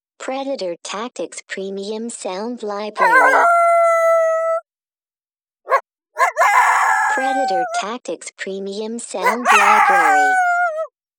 FROGGY

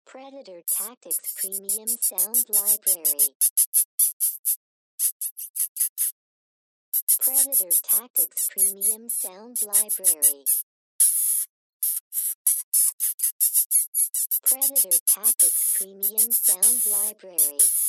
Squeak Freak

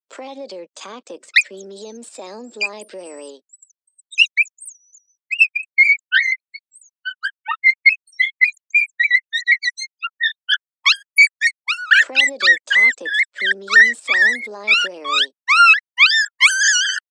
Submissive Pup

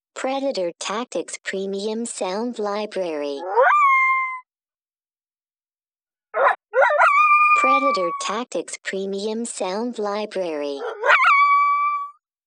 Teenage Male

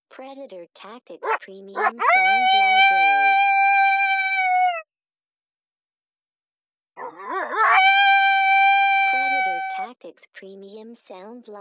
HOWLS

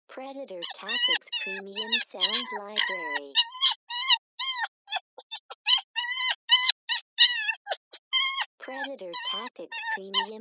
Red Fox

